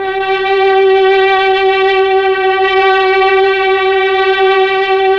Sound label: STR, Melo. Strings, Slow Strings